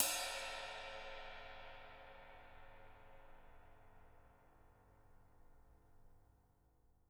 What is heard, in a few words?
JZ RIDES, Living Drums